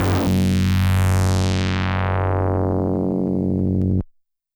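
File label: DX100 F#2; rocket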